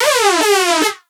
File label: Trap Synth